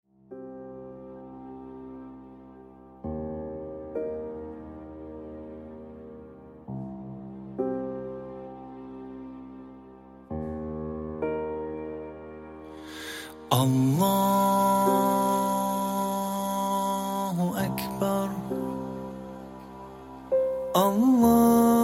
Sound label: prayer ringtone